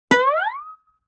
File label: Guitar Plink Slide, Vintage Cartoon, Musical Elements; Sony Pictures Sound Effects Series Volumes 1-10; Sony Creative Software